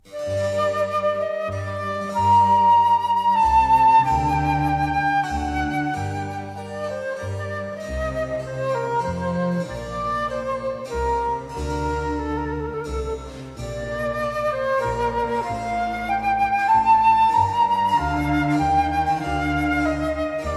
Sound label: Sonata in g minor for flute and b.c., Sarabande; RV 56 from 'Pastor Fido'; Adagio